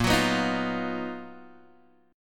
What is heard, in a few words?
B Major Sharp 11th A sharp